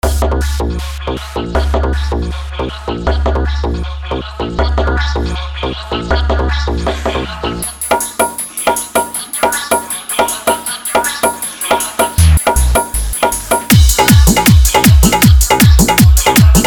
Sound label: Old Bhojpuri Hard Dhollki Bass Mix